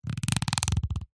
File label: industrial ratchet plastic turn clicks